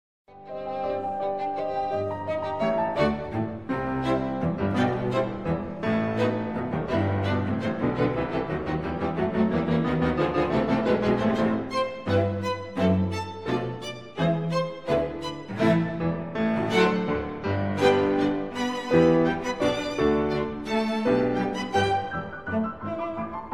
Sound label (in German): klavierquintett satz